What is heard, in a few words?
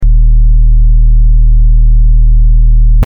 Low hum